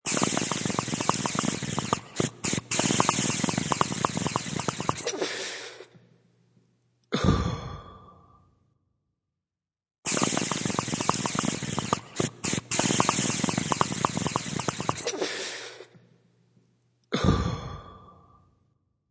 Waterpipe Exhale; Novelty Ringtone

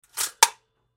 Toaster Push Down Mechanism Spring Latch; Household Toaster Push Down Mechanism Spring Latch Foley Appliance; Blastwave

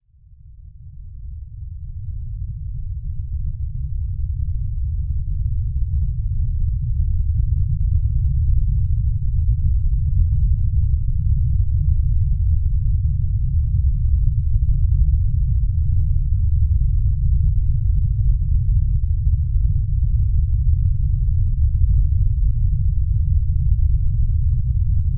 Weather Earthquake Rumble Deep; Rumbles; Sound Design

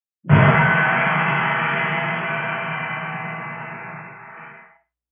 Evil Crash, Metallic; Metallic Sound Effects

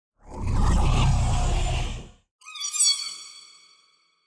Distortion Left To Right; Distortion Feedback Right To Left To Right

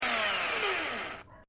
Drill, Hand Drill, Switched Off; Tools; Stuart Duffield

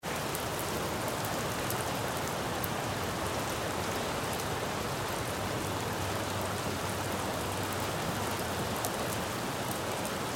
Rain Street City Splatty; Weather; Big Room Sound

